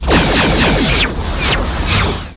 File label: torpedoes